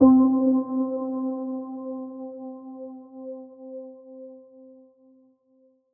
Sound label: KEYS Steel Drum - C, Synth One Shots